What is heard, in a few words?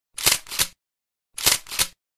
Shotgun cocking